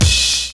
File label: LIVE CLAP; Univers Sons